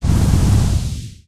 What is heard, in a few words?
Sword Create Circular